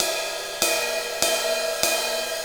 Ride Cymbal Pattern; Percussion; Essential Percussion; Ride Cymbal Patterns

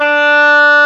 OBOE D3; AKAI S-Series CD-ROM Sound Library VOL-8

